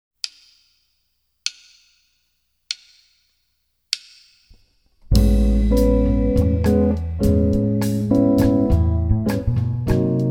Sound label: Vamp